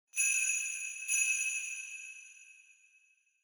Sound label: Sleigh Bells Reverb Knock Hard Short